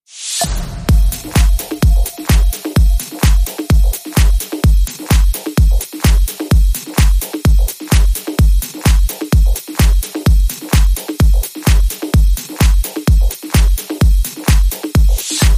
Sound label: Beat